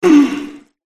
zombie wound

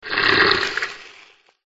scorpion attack voice; up

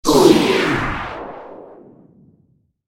die

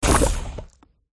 jelly fish wound

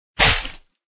attack contact; norak

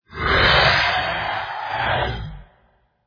lich death

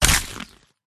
tiger attack contact middle

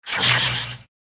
Dark Spirit Wound

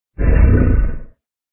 attack; haot

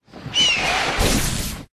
Hawk; pets; yastreb

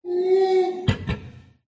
Gorilla Die; pets

